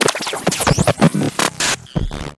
production element glitch static, Distortion Glitch SFX; PremiumBeat